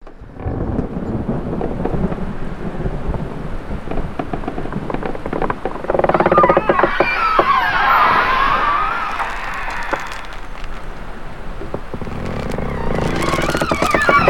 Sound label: creaking gates; Halloween Nightmare CD Sound Effects